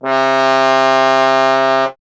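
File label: bass trombone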